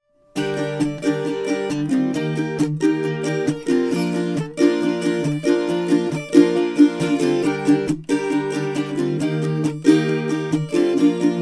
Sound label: 12 string bubinga; veillette gryphon